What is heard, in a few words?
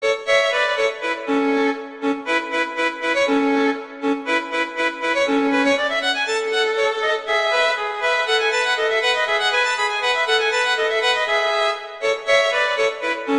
Duet; Mozart Duets